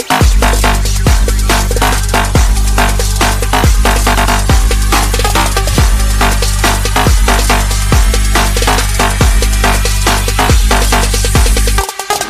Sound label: english ringtone